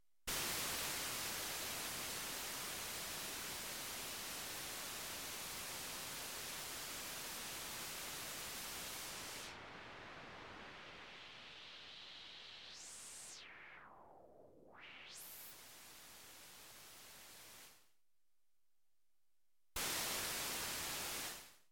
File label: noise, Kawai sx240